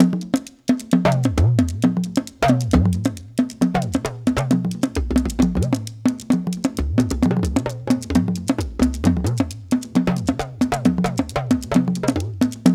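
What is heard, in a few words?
132 bpm, Ju-Ju Talking Drum Lead; Spectrasonics; Supreme Beats; African Contemporary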